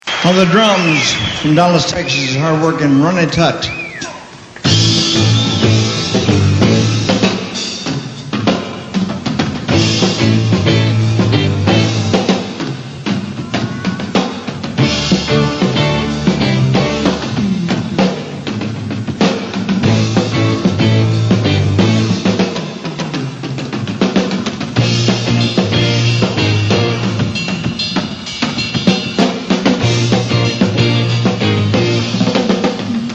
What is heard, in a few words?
solo drum